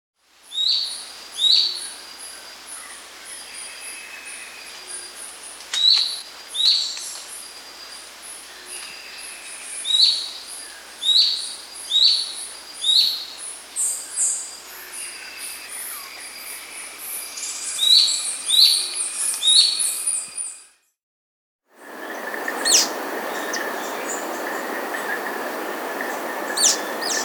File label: Slaty Antwren (Myrmotherula Schisticolor), Andean Birds; S. America